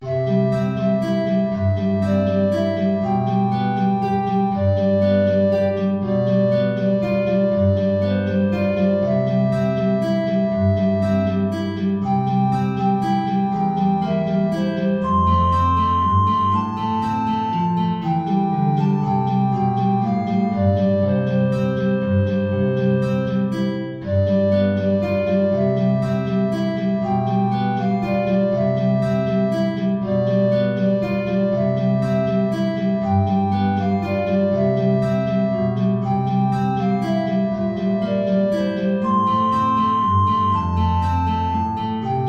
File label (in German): Altflöte & Gitarre, tiefe Notierung; Süßer die Glocken nie klingen